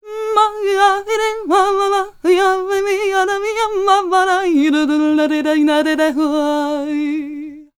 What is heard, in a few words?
MEH1 VOX, Balkanish Traditions